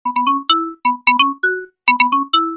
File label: SMS Ringtone; Vivo S18 Pro